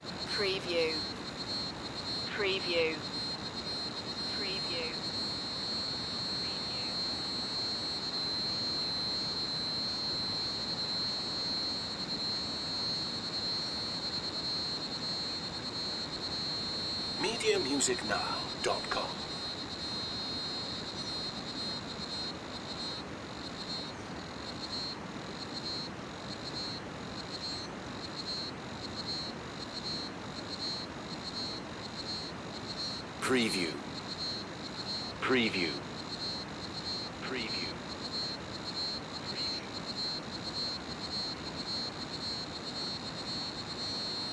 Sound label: special crickets